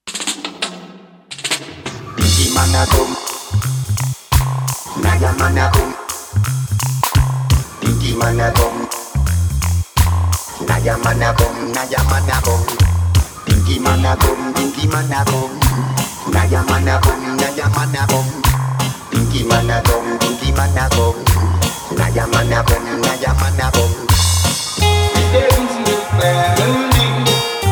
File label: bongo man train mix